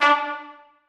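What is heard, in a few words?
trumpets staccato c#4; Sonatina Symphonic Orchestra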